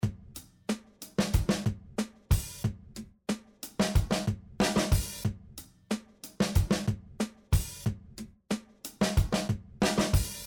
Slices Mixed

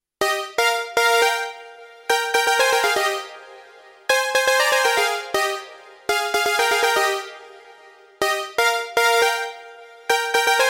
JunoDS88 80s Brass Mariachi Run

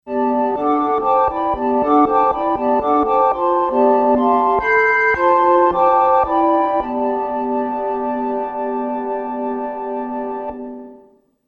Artiphon ORBA 2 Passage Chords

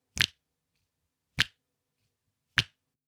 Snaps Pitch Shift

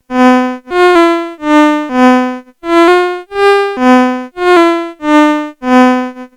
Casio VL1 ADSR

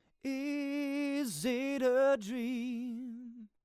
vib

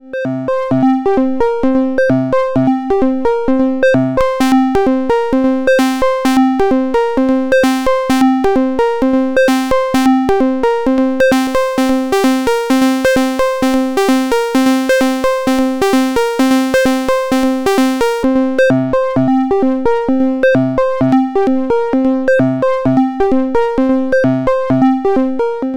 Trident Sync und Track; Rossum Electro-Music; Zing

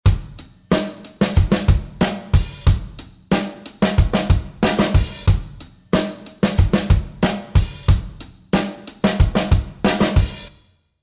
Reverb

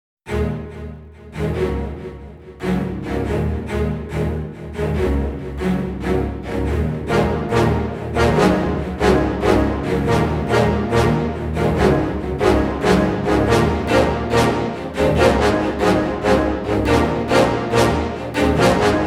Marcato Strings Brass WW Runs

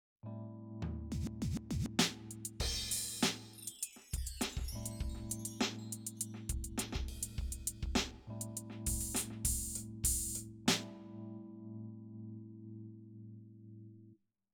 Simmons SD1200 R n B

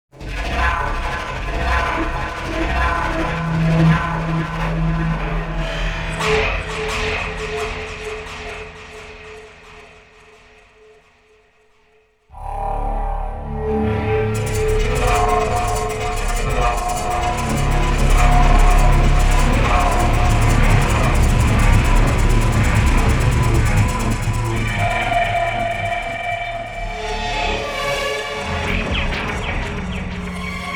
Sequential Prophet X Horror Film

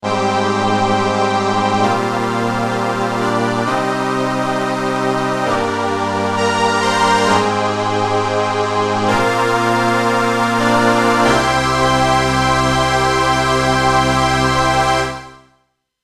Solina Strings

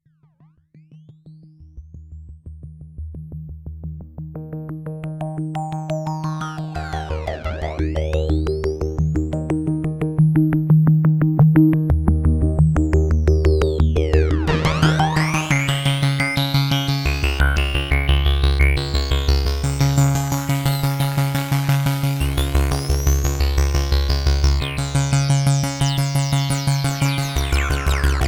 OB8 Ext Filter FM

